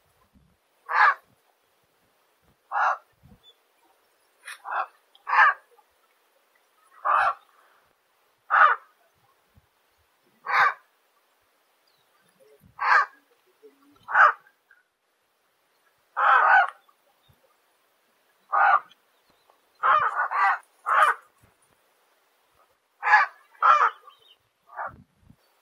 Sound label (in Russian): kolpica